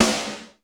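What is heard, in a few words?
GATE SNARE; Crypton - DrumBase5000; GATE KITS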